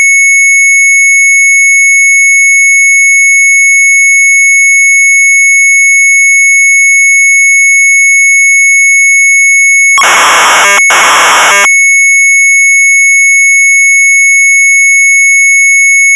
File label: Sharp MZ800 Tape